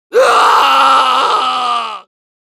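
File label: Soldier pain critical death, es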